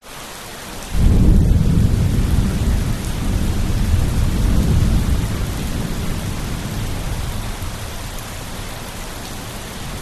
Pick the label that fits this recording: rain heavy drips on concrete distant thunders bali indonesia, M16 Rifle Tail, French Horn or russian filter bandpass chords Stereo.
rain heavy drips on concrete distant thunders bali indonesia